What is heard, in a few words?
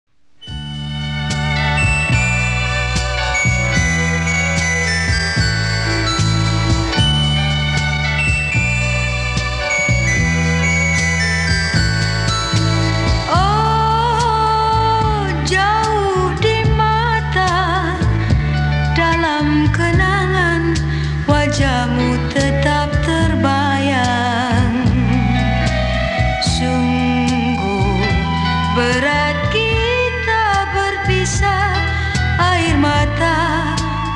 jinggle